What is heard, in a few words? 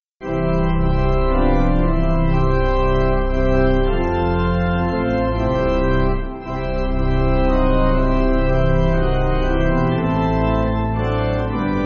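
Pipe, Music Backing Files for Churches - Pipe Organ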